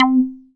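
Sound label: PLK C4, Roland SH-09 synth pluck; Leads and Keys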